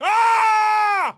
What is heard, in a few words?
male scream